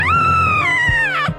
impaled scream; htf petunia

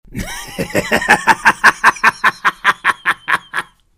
man laughing like a devil

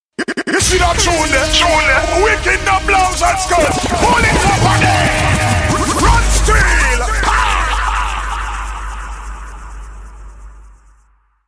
reggae sound effects pull ups

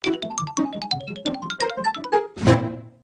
playing fast; gamecube

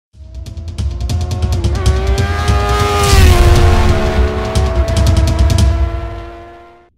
cbr 600rr exhaust sound

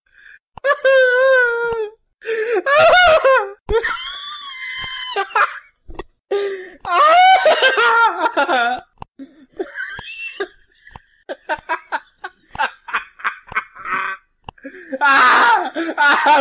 laugh cry

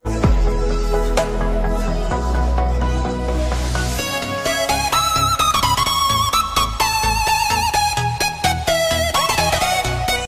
only music

